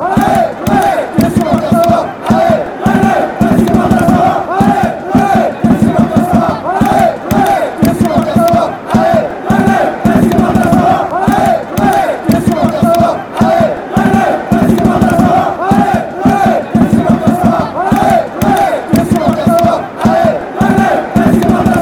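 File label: rennais, football chants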